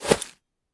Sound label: put in, Revolver; Items Weapon